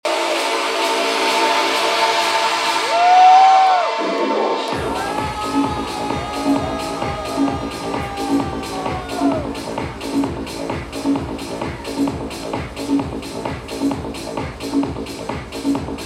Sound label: recorded set mic eq